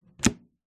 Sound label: household air fryer oven door close med hard